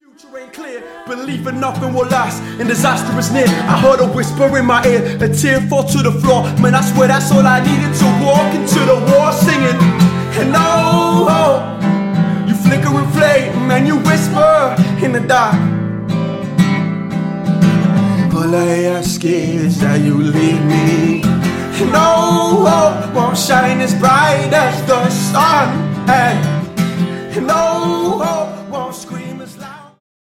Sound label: oh hope acoustic